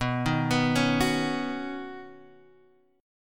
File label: C sharp 7th Suspended 2nd Suspended 4th, B